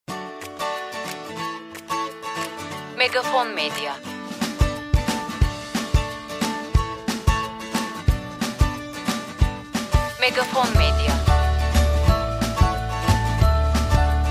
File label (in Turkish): SANTRAL MÜZİK